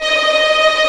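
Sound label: Vio tremolo D#4; Digital Sound Factory Virtuoso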